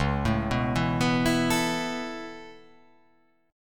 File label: Db Minor 7th Sharp 5th Db down, chord; arpeggiated; JGuitar